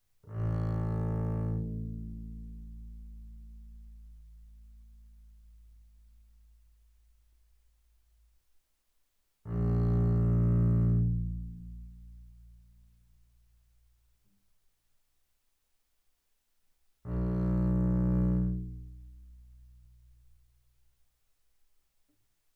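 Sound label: Bass arco sul A fortissimo A1 B1, double bass; Strings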